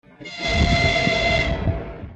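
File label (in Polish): Gwizd ST44, whistle, ST44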